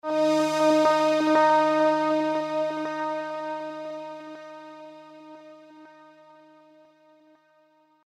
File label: boot audio; Vodafone; Smart-Mini; switch on off